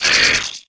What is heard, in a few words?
Monster Worm type Attack; Inotia 3